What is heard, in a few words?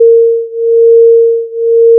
additive voice single; FFTimbre